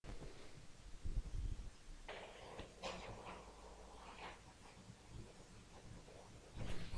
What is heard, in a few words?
cow